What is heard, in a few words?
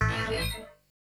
Industrial 140